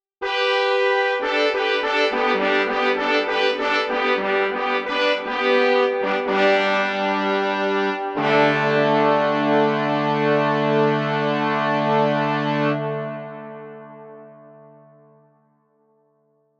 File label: Horns